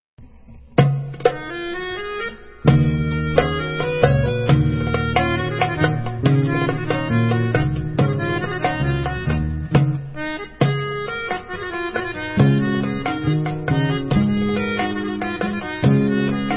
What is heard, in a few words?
Instrumental